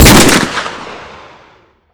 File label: desert eagle; Modern Warfare